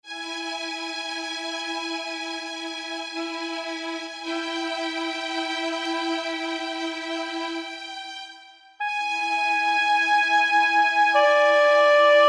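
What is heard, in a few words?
Strings Oboe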